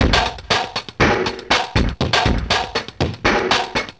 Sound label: Beat, Industrial